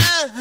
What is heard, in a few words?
uhhh kiss